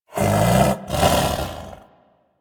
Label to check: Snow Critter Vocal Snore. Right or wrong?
Right